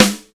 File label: sizzle snare g key, steel snare drum sound tuned to the G note. Loudest frequency: 2400Hz